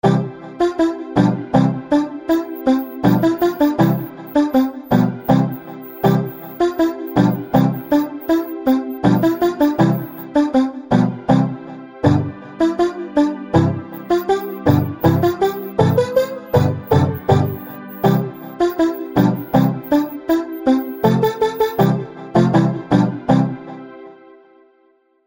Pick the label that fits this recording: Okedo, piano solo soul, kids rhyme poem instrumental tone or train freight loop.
kids rhyme poem instrumental tone